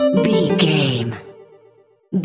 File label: jingle chime negative